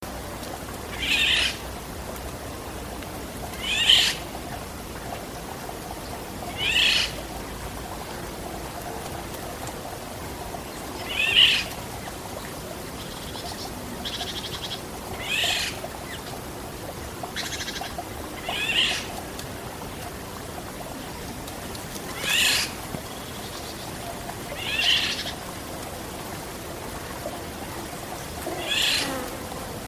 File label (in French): loriot d europe